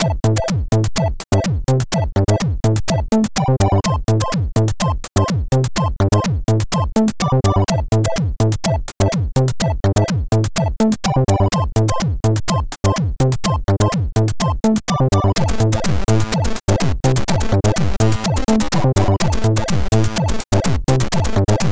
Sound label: funk